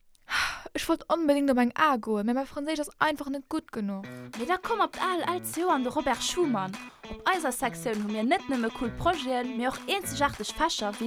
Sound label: jingle